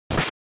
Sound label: NES Fighting Hit